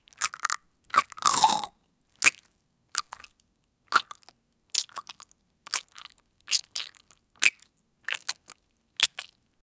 mouth nuts, mouth

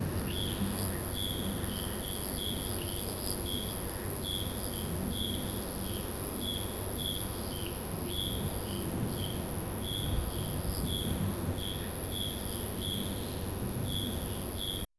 flock of bats chasing you

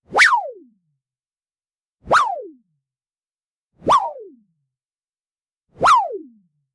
weapon

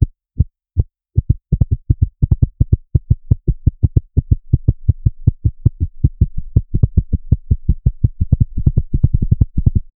fast heartbeat slows and; dying

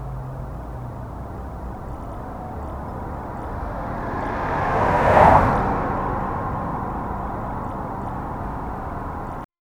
a calm city street at, night

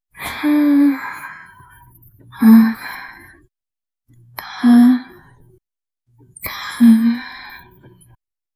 a long deep premium quali

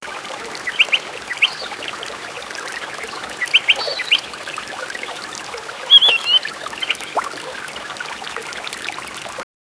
clear water flow no noise

